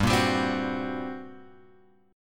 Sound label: G Minor Major 7th Double Flat 5th, G; GmM7bb5 Chord {3 1 X 0 1 2} strummed